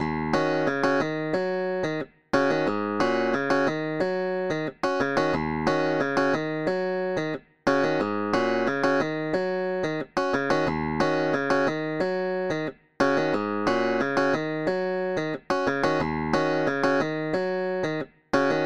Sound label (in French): Thème main gauche, funk piano; Second Line 2 + 3